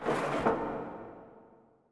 amb hangar misc; Earth and Beyond; EA Games

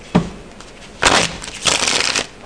shuffle; VEGAS Girls

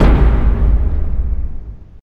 drums giant; Gamestar

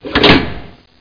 Breaker Switch

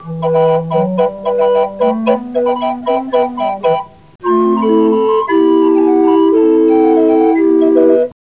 psycho; winworld

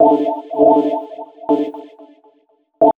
D+B 160; eJay

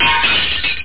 glass break

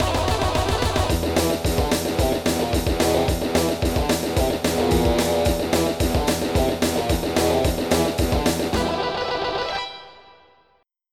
yeah; Ghost

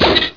POP

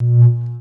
cloak phase; Earth and Beyond; EA Games

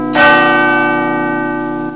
Big Ben

